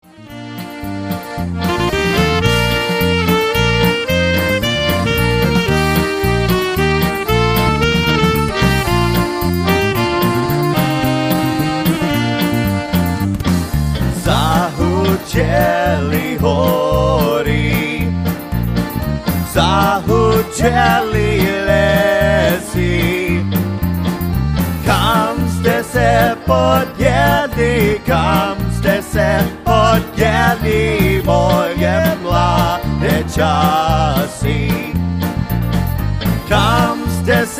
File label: Polka